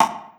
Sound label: slap conga, Percussion; realdrum